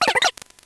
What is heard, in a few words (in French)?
Cri